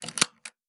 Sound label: rifle unlock; Papers Please